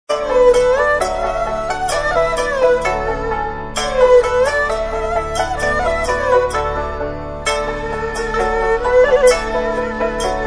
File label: Chinese Soothing